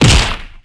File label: hit body, skater; Tony Hawk's Project 8; XBOX